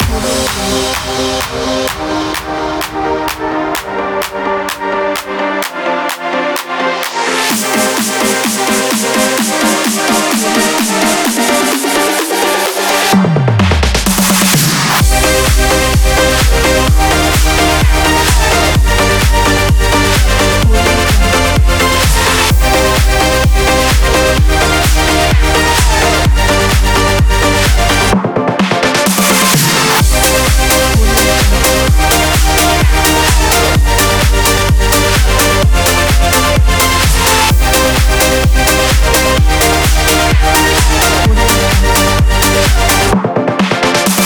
for dance

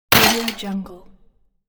broken glass sound

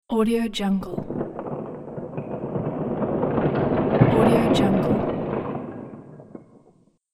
building wall collapse debris

